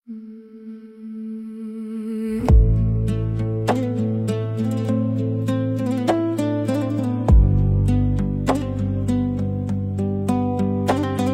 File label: ukrainian folk remix